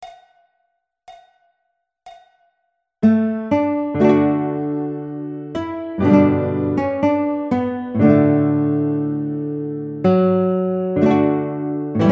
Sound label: Aloha Oe Guitar